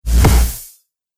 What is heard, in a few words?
Cinematic Hit, Trailer Sound Effects